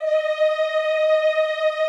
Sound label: EEH D#4, Extended Classical Choir; Best Service